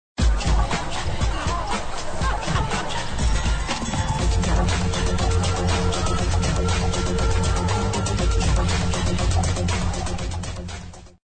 sound club; Motorola V525

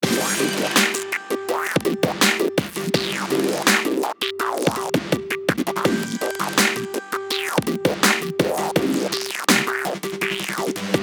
Overclocked, ringtones

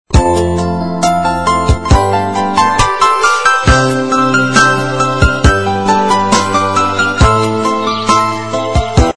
power mid; Coolsand

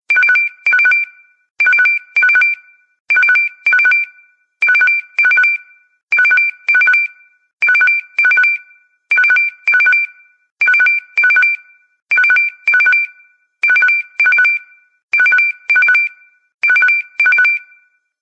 Simple Beep

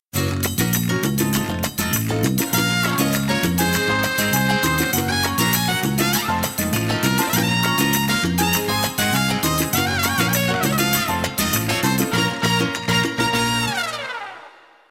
Sabor Latino, ringtones; Alcatel OT-991D

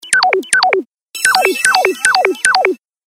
Squirt tone; Samsung; GT-M3710 Corby Beat

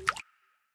water ripple lock; LG G Flex2 F510K

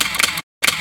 continuous photographic; ZTE Nubia-Z5S-Mini

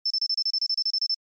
Eco Chirrup

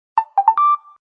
Message incoming; Samsung; SGH-U800 SoulB

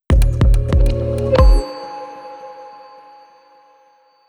Jumping off, switch on off; Alcatel 3 OT-5052D

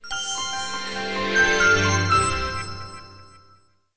Mystic power off; Samsung SGH-i320; Power Sounds

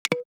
camera stop

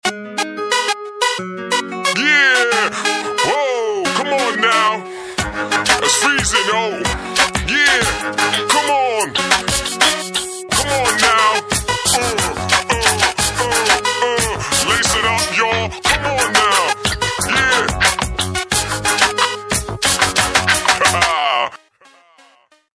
Hip hop Guy

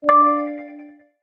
Dragonfly, notifications; OnePlus 12

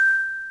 Camera timer; LG KP330

